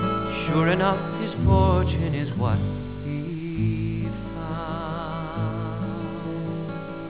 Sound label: ballad